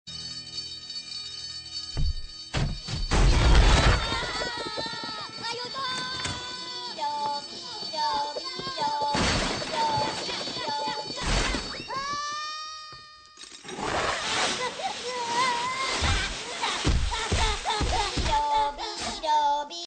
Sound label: alarm; minion